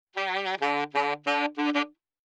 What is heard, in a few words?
OLDSCHOOL BRASS 96